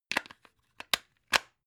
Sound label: plug plug in clatter